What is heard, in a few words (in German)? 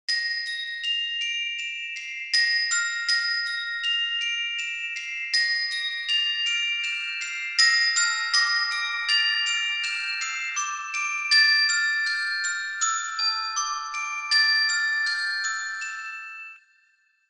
Grossvaters Taschenuhr Glockenspiel